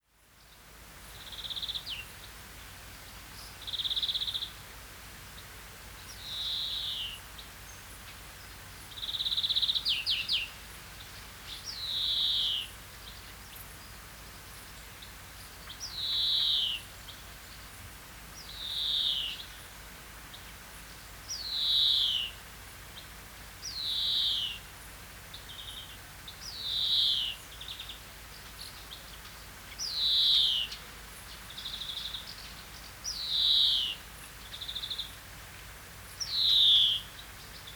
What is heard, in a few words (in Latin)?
Chloris chloris; SETE